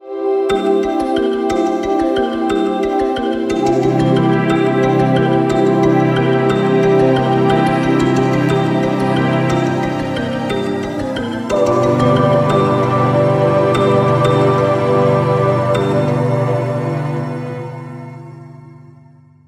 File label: sunrise alarm